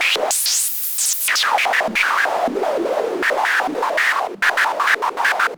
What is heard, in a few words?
Yamaha CS5, Filtered Noise